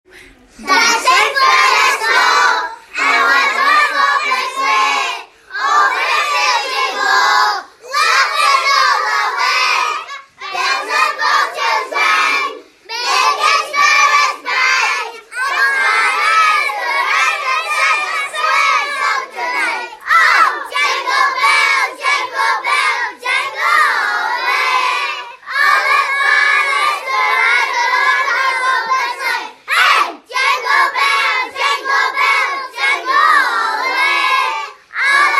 Jingle Bells

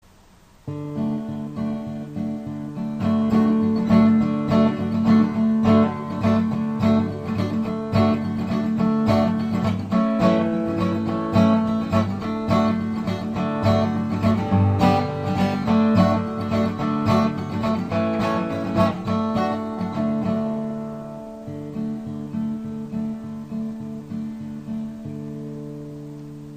backing track

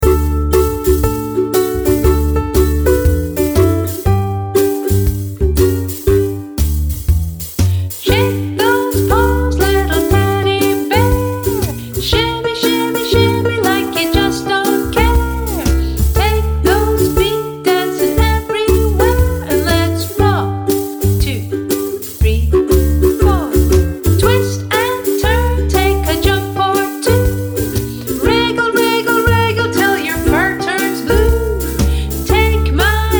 Teddy Bear Rock Backing track With Voice; youth music initiative

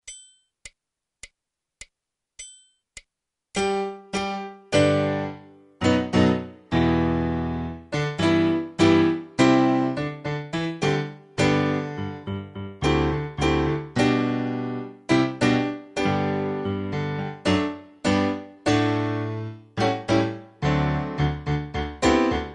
accomp

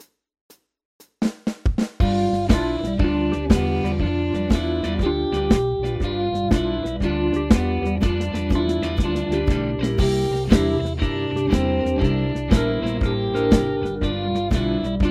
Blues in G

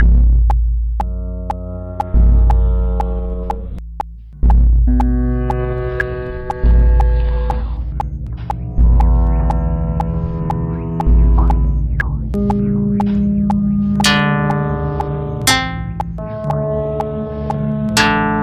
Creepy House

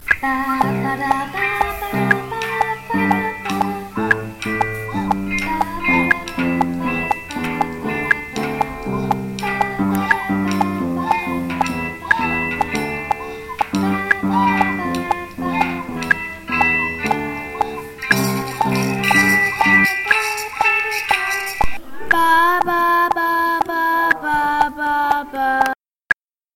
twinkle

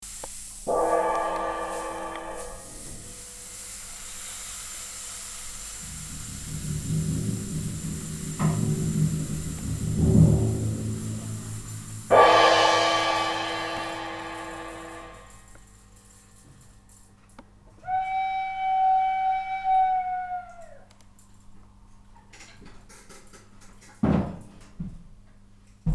HALLOWEEN HORROR

